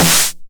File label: 909 SD Tape Sat